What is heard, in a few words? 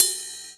Ride Stick, E-MU Xtreme-Lead